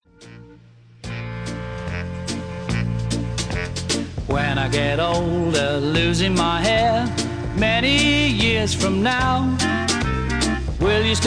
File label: CHILD